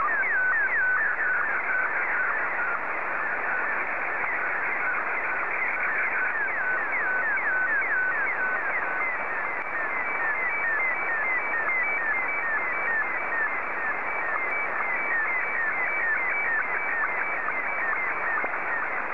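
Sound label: Martin 1, slow-scan television; JA3QJQ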